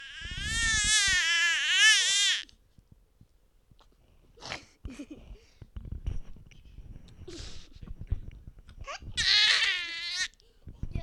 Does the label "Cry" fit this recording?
Yes